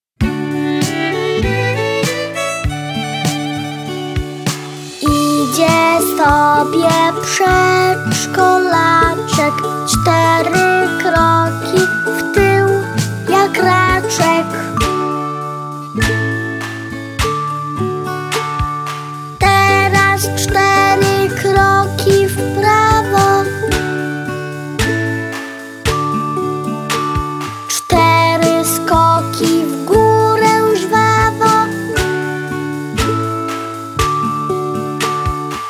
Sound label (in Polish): PRZEDSZKOLACZEK